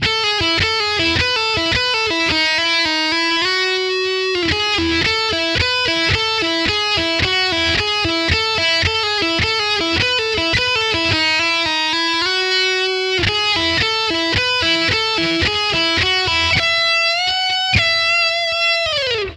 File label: solo slomo